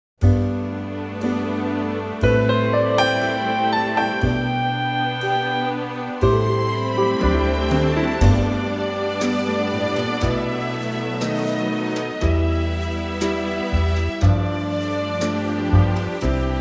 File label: orchestral G